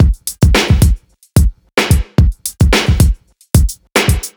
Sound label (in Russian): ударные и барабаны rnb soul; Heady